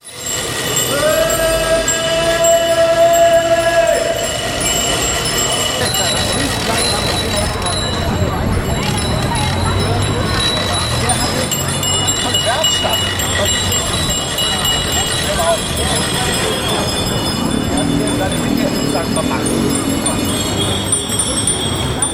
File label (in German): fahrrad demo klingelkonzert; am-trippelsberg